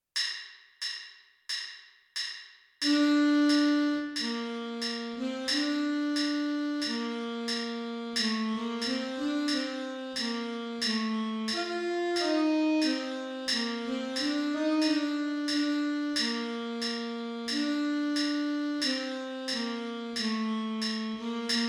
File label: alto sax part b; the conquering hero